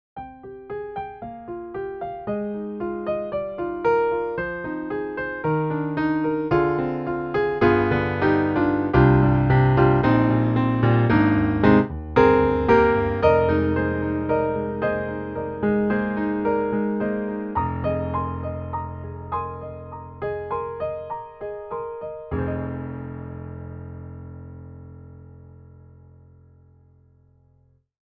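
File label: Mello Grand, piano; IK Multimedia; Sonik Synth 2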